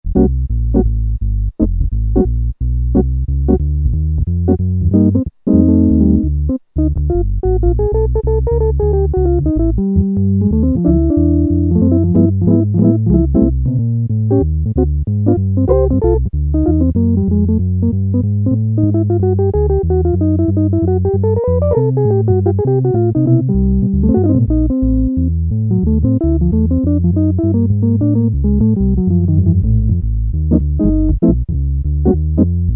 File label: Jazz Guitar, Korg PolySix; Factory Presets Bank A